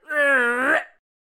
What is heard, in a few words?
Bank Robbers Attack Vocals